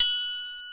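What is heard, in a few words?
triangle; East West; Global Sounds; RY30 PERCS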